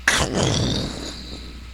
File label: thunder